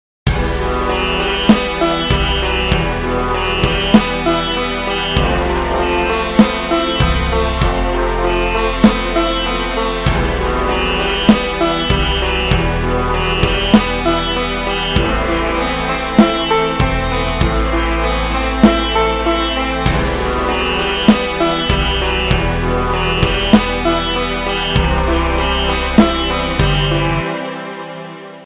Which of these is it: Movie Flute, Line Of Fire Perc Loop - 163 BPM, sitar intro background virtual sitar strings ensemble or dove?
sitar intro background virtual sitar strings ensemble